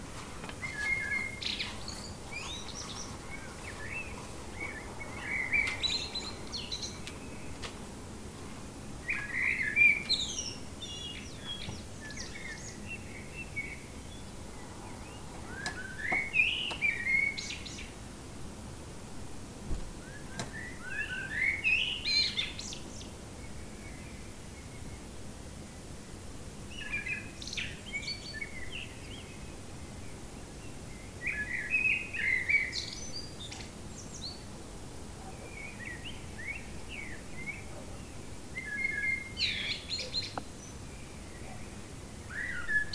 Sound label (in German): vogelgezwitscher coronastille